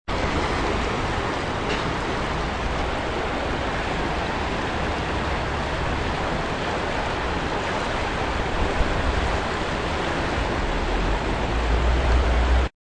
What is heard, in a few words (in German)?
donau